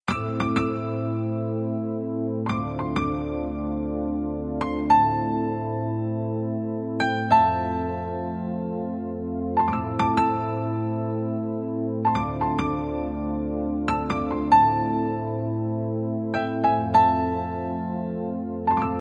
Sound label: Piano Telugu Ringtone